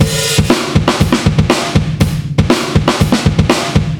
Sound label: Full Throttle A, rock